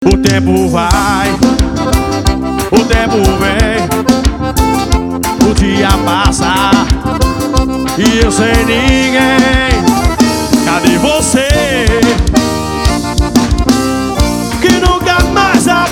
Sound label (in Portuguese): forró